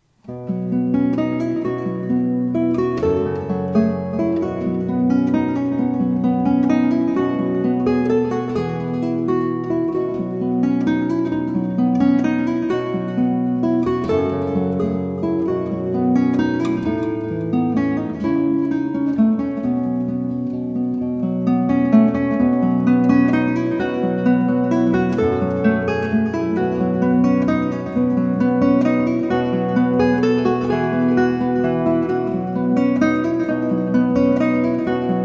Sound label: guitar cover